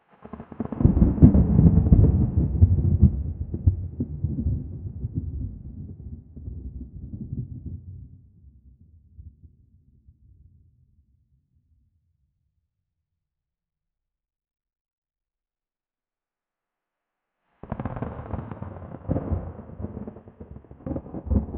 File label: deep cinematic thunder ru; strikes